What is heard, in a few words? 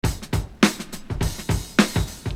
Beat, Hip-Hop Street Beats